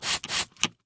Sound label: bug spray; soundspvz